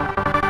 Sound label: synth